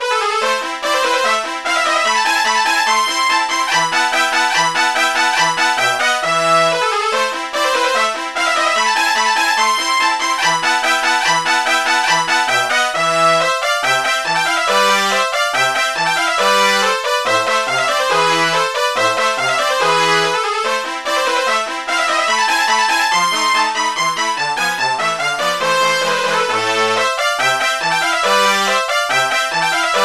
brass section

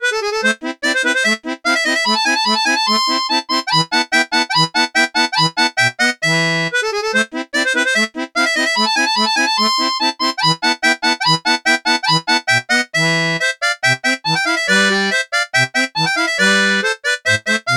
harmonica

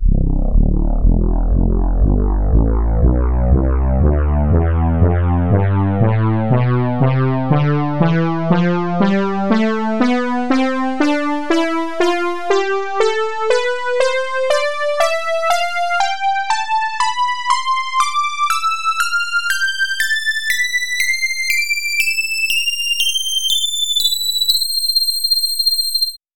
synth brass; tonleiter

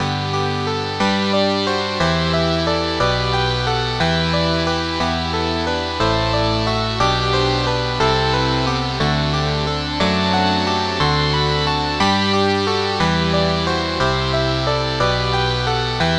charang

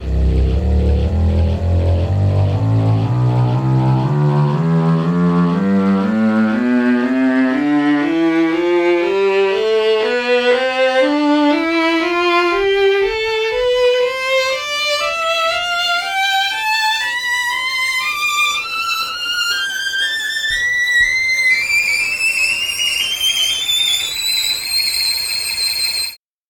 violin tonleiter

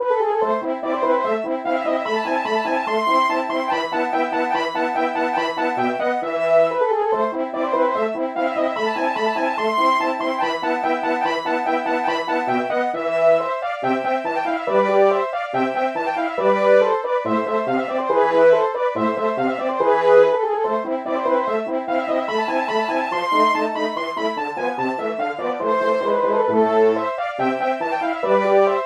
french horns

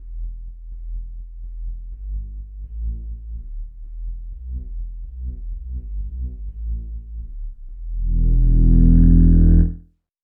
flute bass

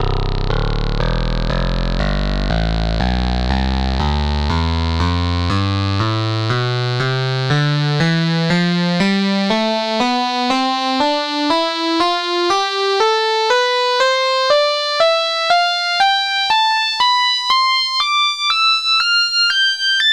charang tonleiter

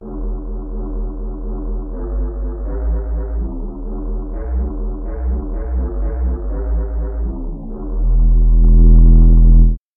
pan flute bass samples

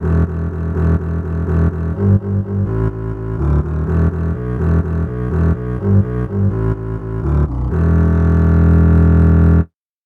contrabass bass

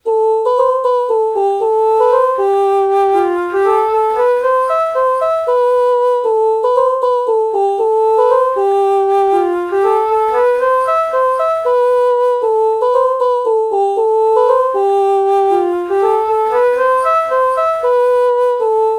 alto flute oh melody morph

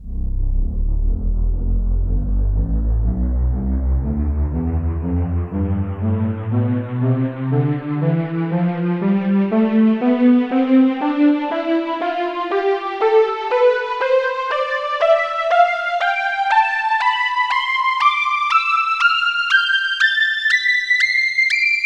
polysynth; tonleiter

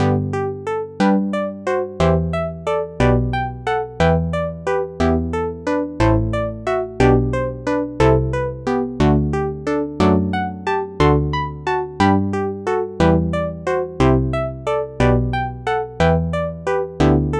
synth bass

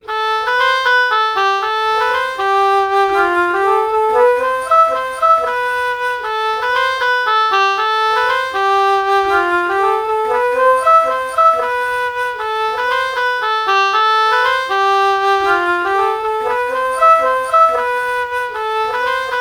alto flute oboe melody morph

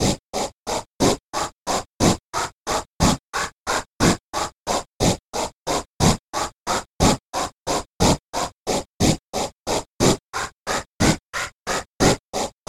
breath noise

